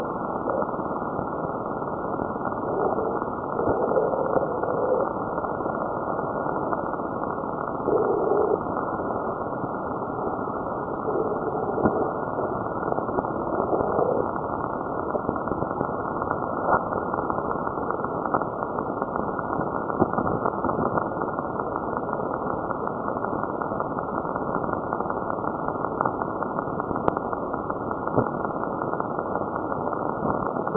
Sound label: ulf